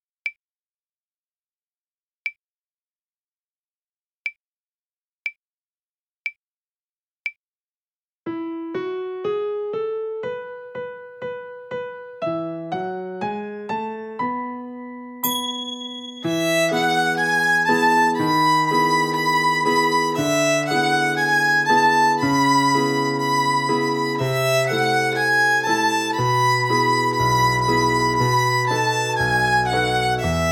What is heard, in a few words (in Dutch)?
op alle snaren Viool